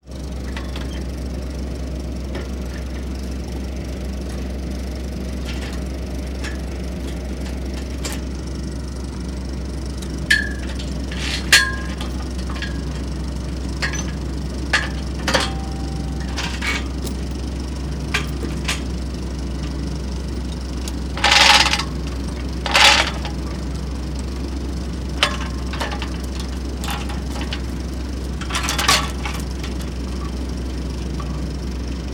industrial logging undoing chains from around logs truck idling; sound effects one